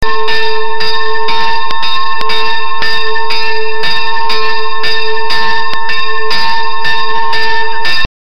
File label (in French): cloche